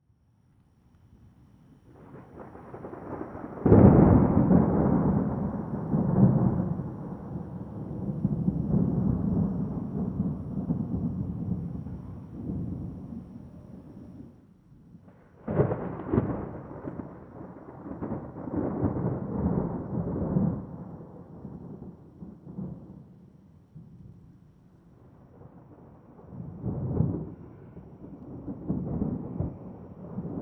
Super Thunder

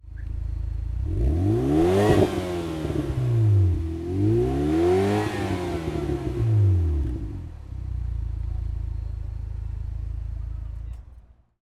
Car Show

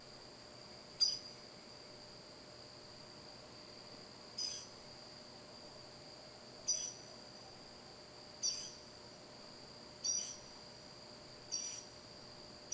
Loud Night Bird Animals Wild

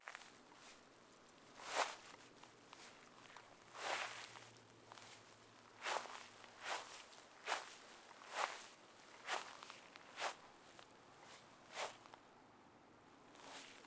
Footsteps Grass, Foley

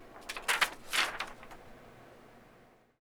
Turn Page Office

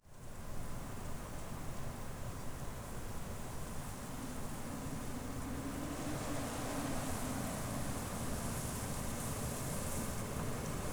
Windy Spring Day Outdoor Ambience

